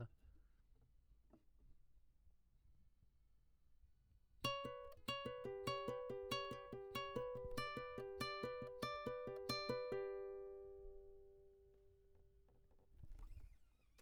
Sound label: Classic Guitar